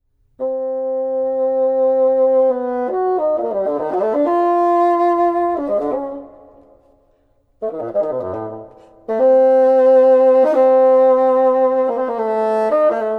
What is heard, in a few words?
Soliloquy for Bassoon